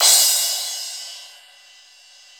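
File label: CYM Cra, crash cymbal; Best Service Gigapack 2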